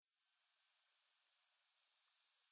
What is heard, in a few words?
amb Crickets; willyshotchillies